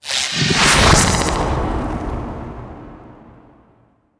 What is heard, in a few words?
Transference; Sacrifice Forest Night End